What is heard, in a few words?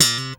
POP HMR, SLAP BASS